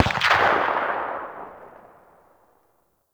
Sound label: Weapon Enemy Tail Outdoor; Warframe; Weapons